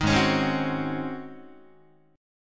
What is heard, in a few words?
G sharp 11th G sharp, down, Guitar; G#11; strummed